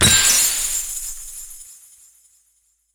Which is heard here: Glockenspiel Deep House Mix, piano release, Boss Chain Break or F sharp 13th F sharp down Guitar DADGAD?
Boss Chain Break